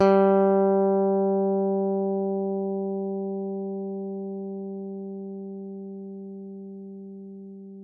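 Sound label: Yamaha pick G 3, Bass; Masterbits CLIMAX 7; PATITUCCI BS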